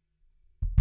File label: BD In